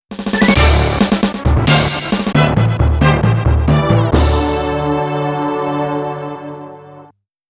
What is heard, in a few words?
Stage Clear; Castlevania Advance Collection ORIGINAL SOUNDTRACK (2025)